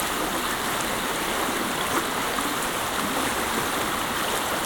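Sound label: waterfall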